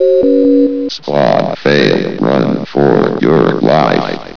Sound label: run